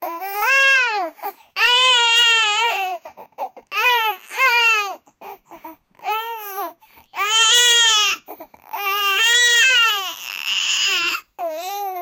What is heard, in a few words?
a man clapping a baby, baby sounds